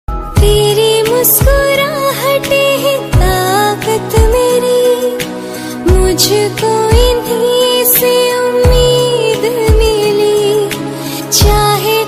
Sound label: female version